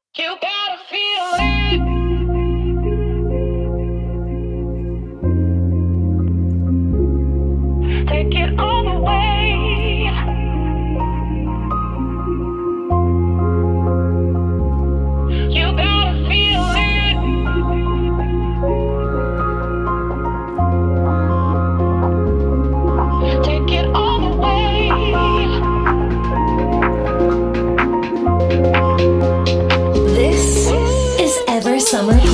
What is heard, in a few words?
melodic house techno electronica; eversummer podcast